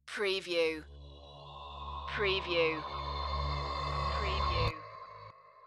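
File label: Short Creep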